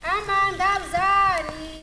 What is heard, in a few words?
AMAN, SOLO FEM; Spectrasonics; Heart of Africa 2